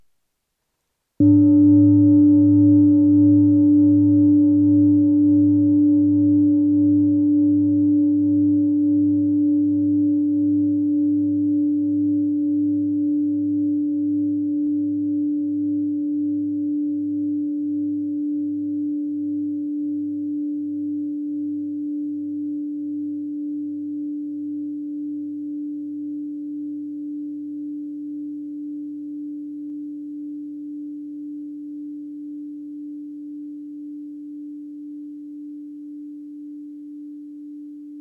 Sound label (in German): Klangschale; Frequenzanalyse; 1.590g